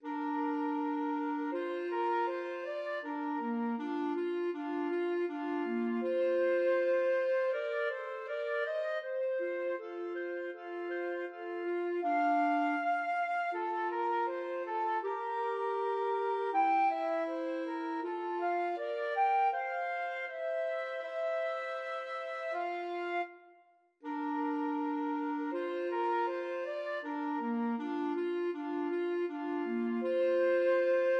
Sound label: flute clarinet duet